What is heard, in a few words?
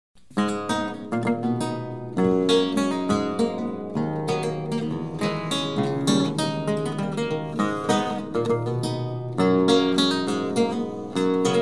Canarie